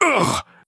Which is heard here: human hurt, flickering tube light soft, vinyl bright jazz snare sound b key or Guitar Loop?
human hurt